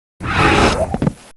Air Elemental Death Sound; Heroes3

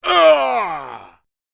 Gunslinger Death Sound

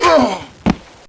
Archangel Death Sound; Heroes3

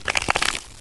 Skeleton Warrior Move Sound; Heroes3

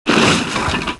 Ammo Cart Death Sound; Heroes3; War Machine

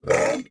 Olgoi-Khorkhoi Hurt Sound; Heroes3

